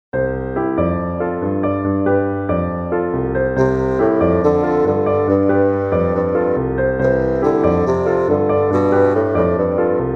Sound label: Peanut Salsa